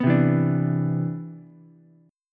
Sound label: C Major 9th, Ukulele, C6; strummed; CM9; 4,0,0,5